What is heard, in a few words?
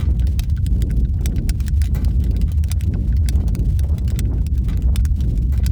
damage; World of Tanks